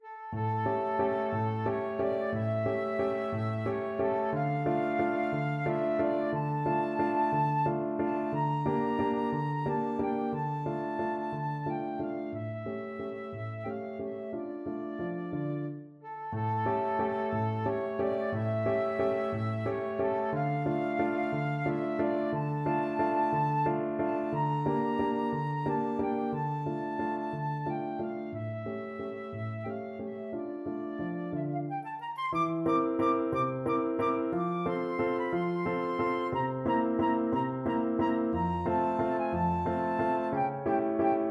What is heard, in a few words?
anniversary danube flute